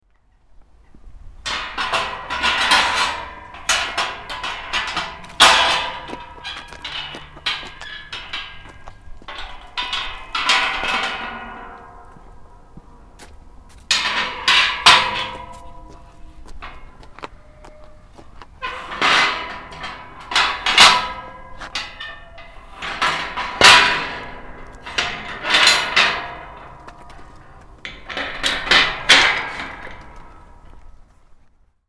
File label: Metal Gates